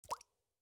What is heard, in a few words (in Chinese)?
滴水石锥水滴入炼药锅声